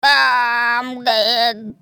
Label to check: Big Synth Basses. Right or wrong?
Wrong